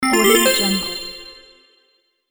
bright right answer; cartoon

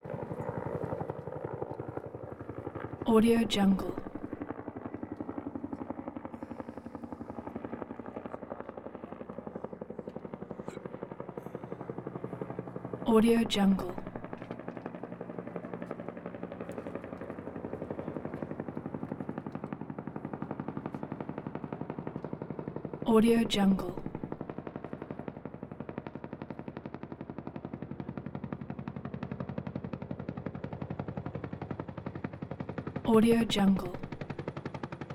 helicopter l z with voices under helicopter; نزدیک شدن هلیکوپتر

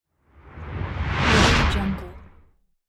whoosh; transitions and movement

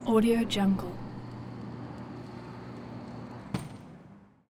airport electric door noisey airport terminal; urban sounds; درب برقی فرودگاه

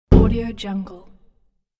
snowball hitting window from indoor; domestic sounds

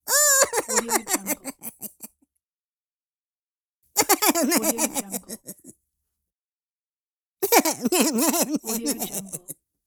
crying upset character; cartoon

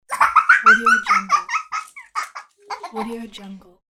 little girl laughing; human sounds

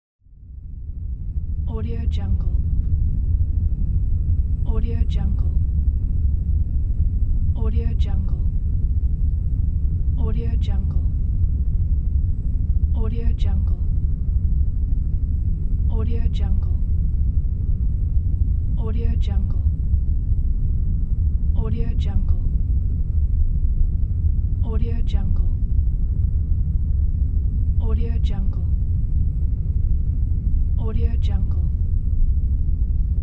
spaceship interior ambience; futuristic sounds

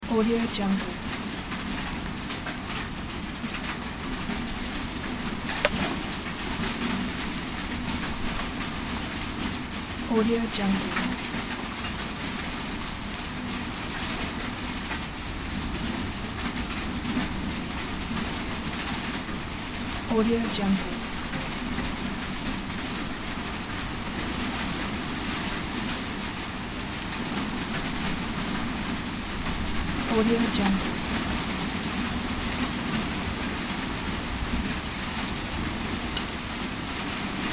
medium hail storm at village; nature sounds; طوفان تگرگ در روستا

